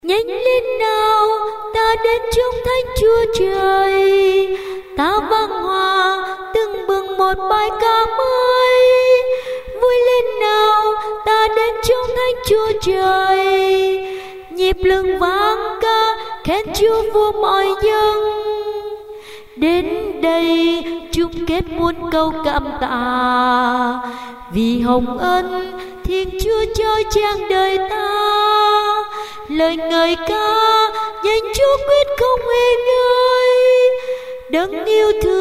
tenor basso

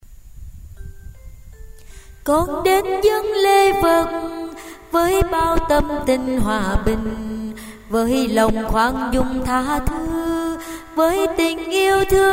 tenor basso

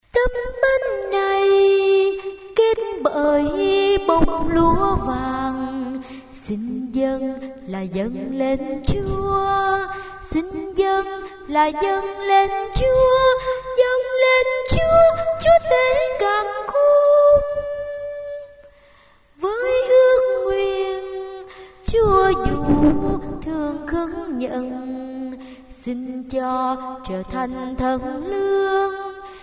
basso

